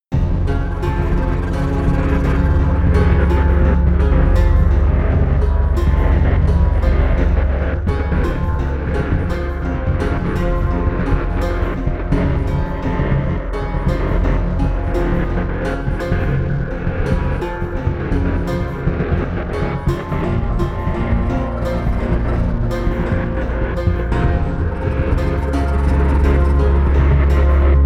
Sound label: atmosphere oriental strings